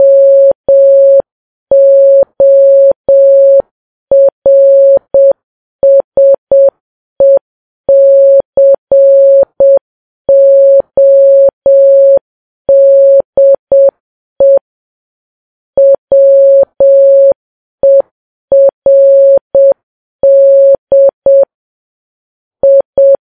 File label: morse